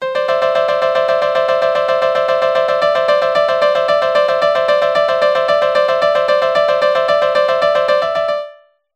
Vl1 Vl2 Vla; Udelt takt

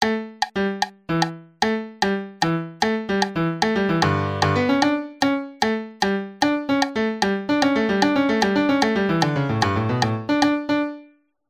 Vc (MIDI); Udelt takt

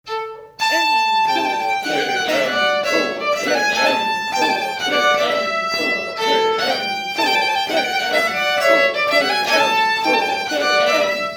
Long medium short pattern

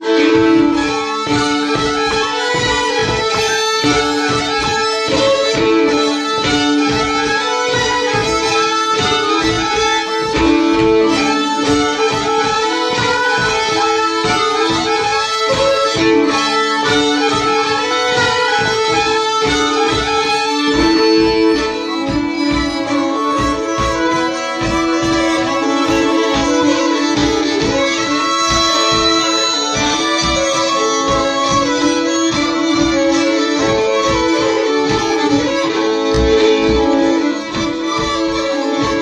Polska; Polska efter Olof Andersson

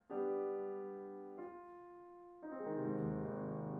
rubato

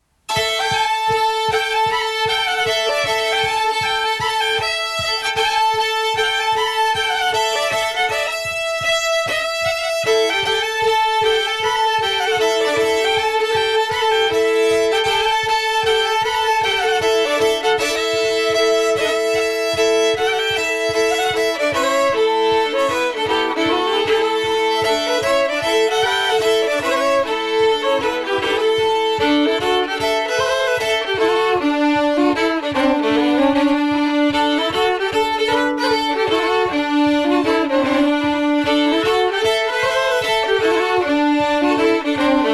Springar; Geitungen

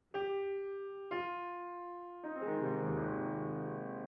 rubato

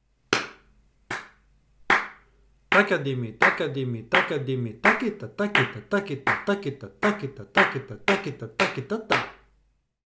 Gati-jathi

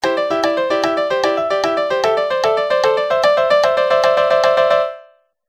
Vl1 Vl2 Vla; Udelt takt

